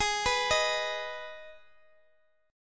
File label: G sharp Minor G sharp down Guitar Open G, chord